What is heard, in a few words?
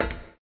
met walk; Marisa Kirisame